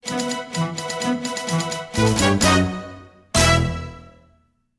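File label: News Flash Playoff instrumental; camp musicfully 2025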